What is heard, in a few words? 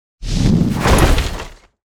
tank debris; factorio 2.0.55